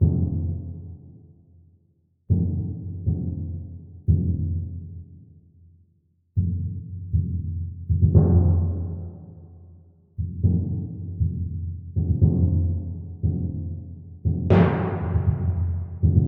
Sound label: timpani; 118 bpm